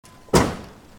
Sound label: ding slam